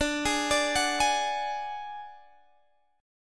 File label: D Major Flat 5th D Guitar Open G, chord